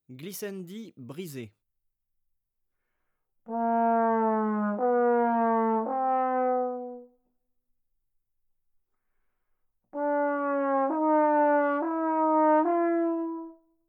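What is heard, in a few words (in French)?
glissandi brisés, trombone; Effets; Glissades; traite orchestration; trombone tenor trombone basse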